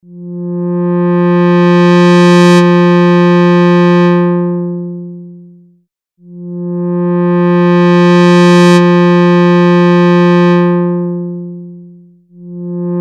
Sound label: ADSR